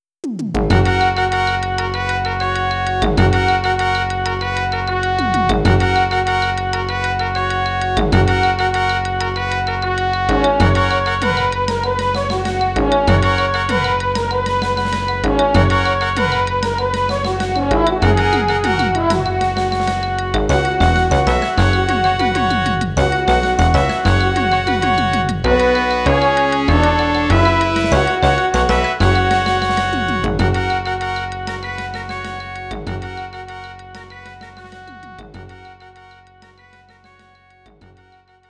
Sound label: intro music mono